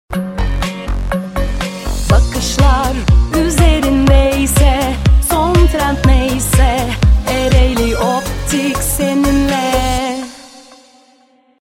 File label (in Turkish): vokal